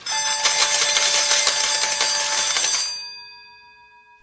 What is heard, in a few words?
win